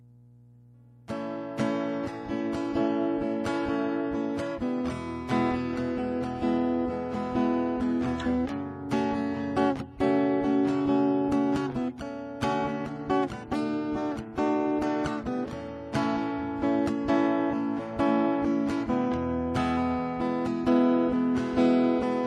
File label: guitar chords